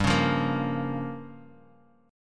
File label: G Minor 7th Flat 5th; Gm7b5 strummed; JGuitar